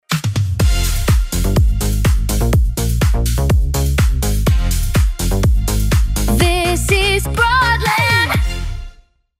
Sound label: INTO BREAK RAMP POWER, Hybrid AI Sung Jingles